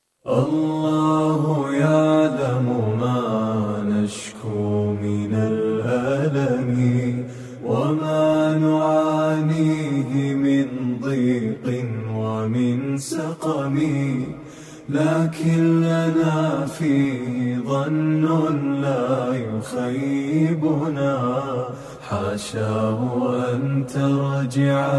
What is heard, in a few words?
vocals only